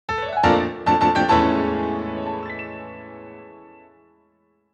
Get Fanfare (Spirit Orb-Rare Item); The Legend of Zelda Breath of the Wild

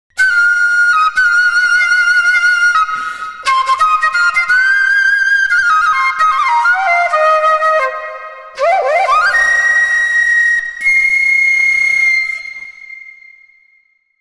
Magic Flute; Disgaea 2: Cursed Memories

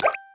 Correct

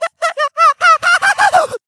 Mad Laughing Chicken; Don't Be Afraid The First Toy

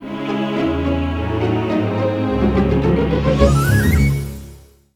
Closing (Stinger); medievil game rip

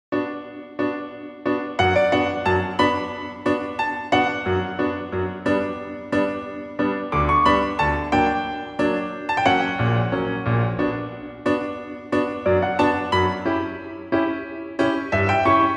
Headquarters Piano; MySims Agents

